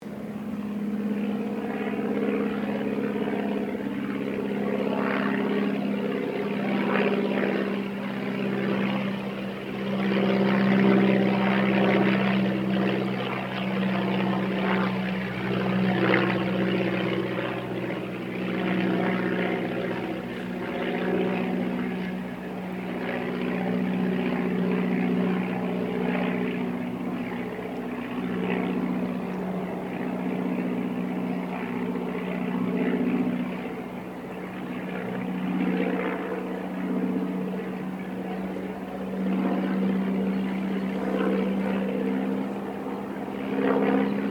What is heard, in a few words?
AIRCRAFT DH82A DEHAVILAND TIGERMOTH PASS DISTANT; 1936 Tigermoth; Airplanes; Sound Effects:Vehicles